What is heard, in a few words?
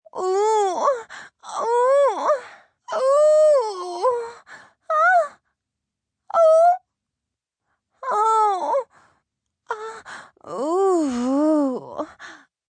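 Sex Multi Cries Female, Sex, female, erotic; Sex Sound Effects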